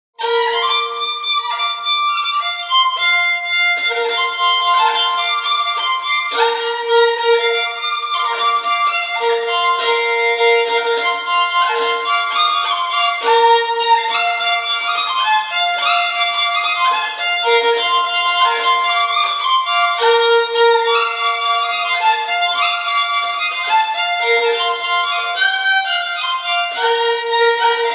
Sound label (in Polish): skrzypce